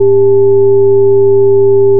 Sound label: additive cello single; FFTimbre